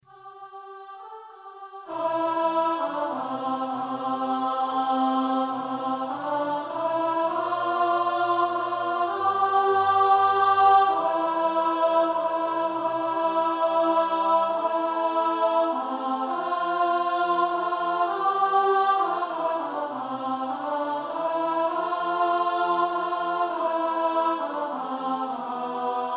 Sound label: Alto